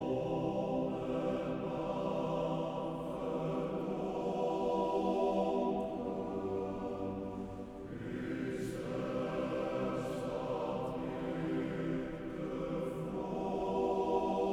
Koor gemengd

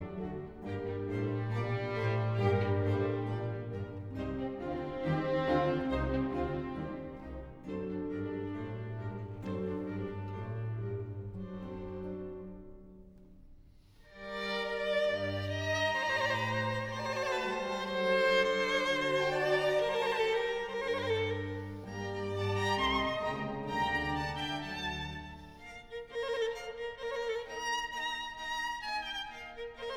Andante vioolconcert nr 6 in G mineur

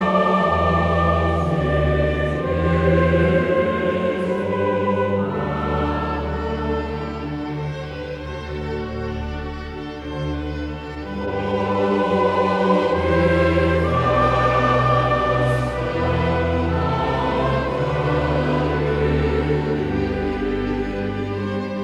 Koor (orkesten)

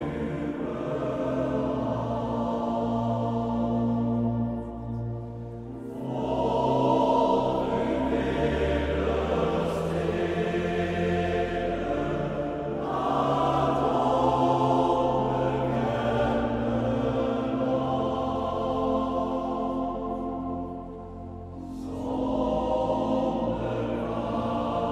Koor (mannen); Urker

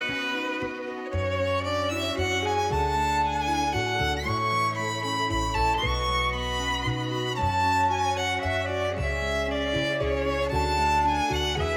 instrumentaal viool

